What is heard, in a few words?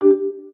Coda, notifications; Amazon Kindle Fire 2nd Gen